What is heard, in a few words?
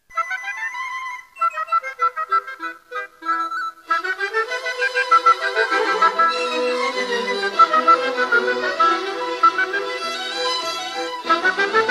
Happy children's song